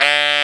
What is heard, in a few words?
SAX XSAX, Saxophones; All Saxes